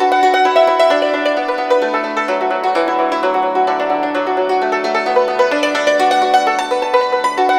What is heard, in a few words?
YNCHIN 90 D; INIS - Opium